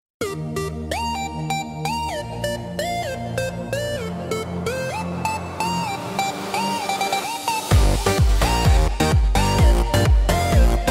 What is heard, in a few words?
techno remix song; Arrow Music